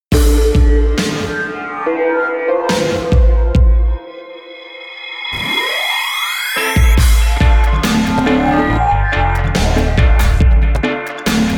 SKANK

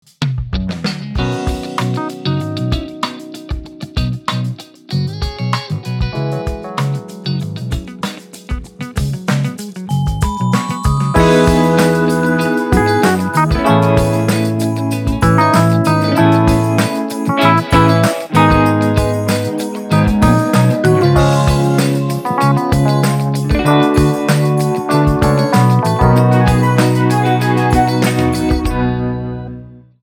INSTRUMENTAL

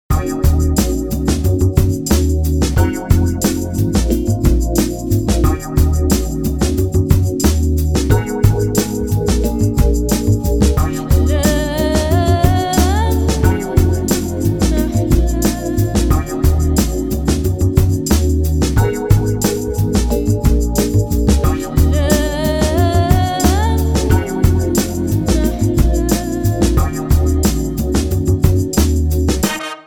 WORLDWIDE SOUL GROOVE

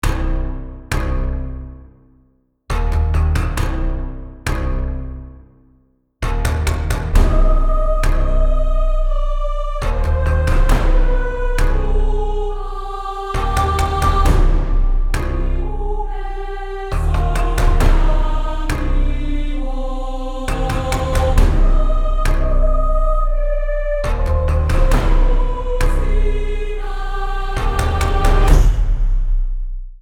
DEATH MARCH

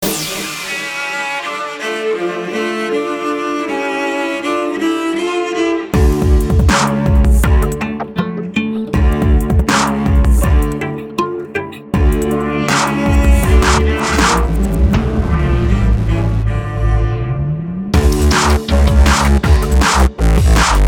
DIRT STRINGS

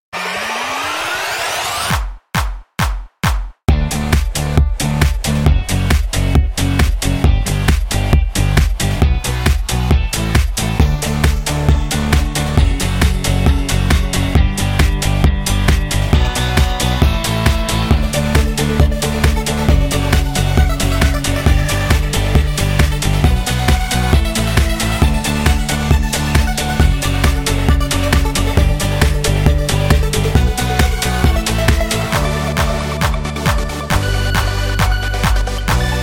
Instrumental

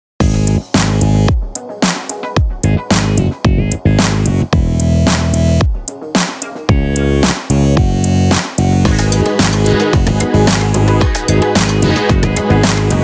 instrumental

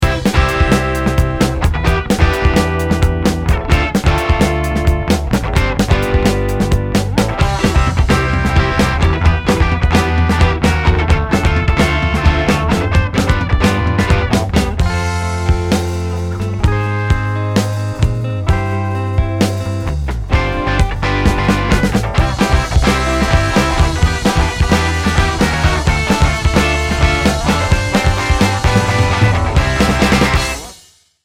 INSTRUMENTAL

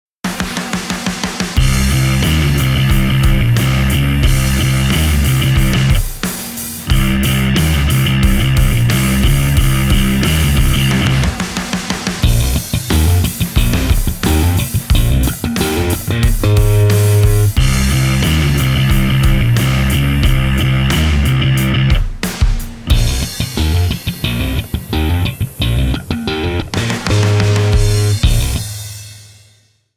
BASS RACE

